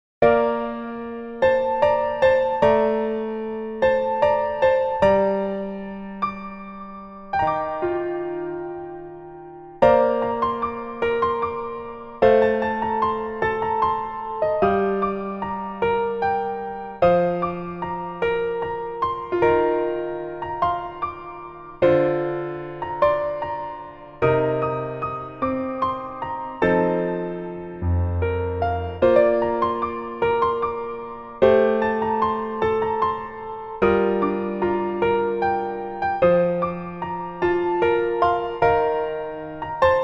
Piano ver, 멜로디MR, 여자키

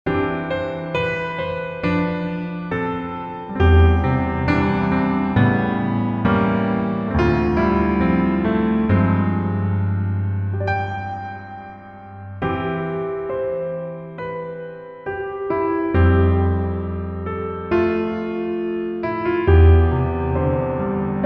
Piano ver 멜로디 MR 남자 키

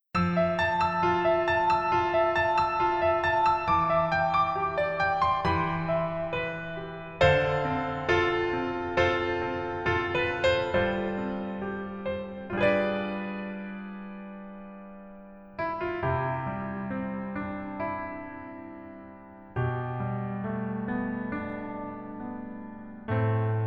Piano ver 남자키

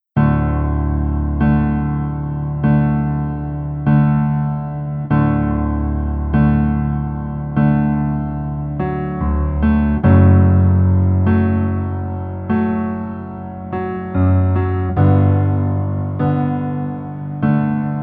Piano ver -1키

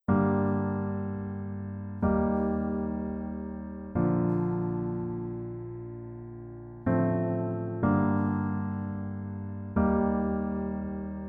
Piano ver 멜로디MR -1키

